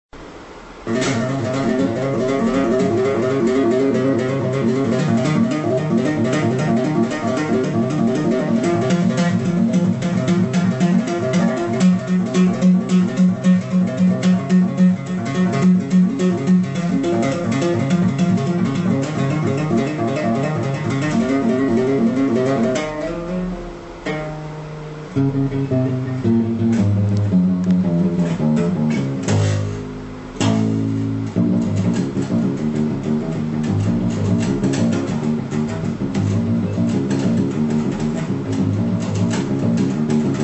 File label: bass tapping; Country Tapping